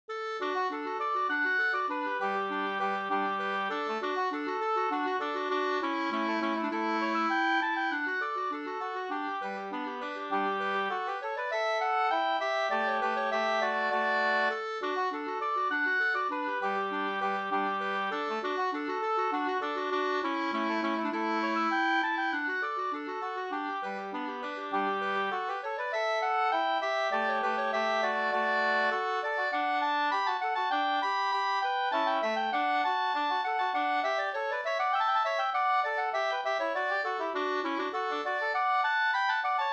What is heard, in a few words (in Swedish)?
slangpolska storpolska 2st